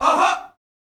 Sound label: Vocal